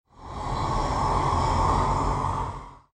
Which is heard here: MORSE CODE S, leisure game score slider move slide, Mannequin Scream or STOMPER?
Mannequin Scream